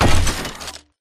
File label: footstep shuffle; Titanfall 2